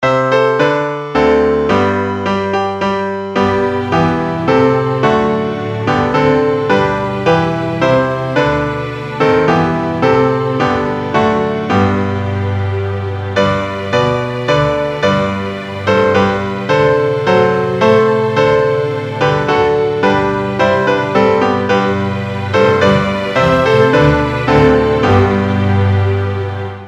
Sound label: America 3 pt 108 BPM